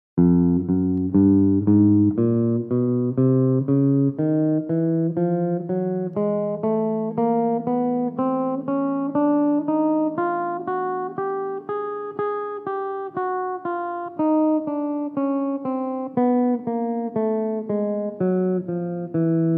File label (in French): rechauffement pour guitare